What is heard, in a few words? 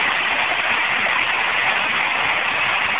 radio static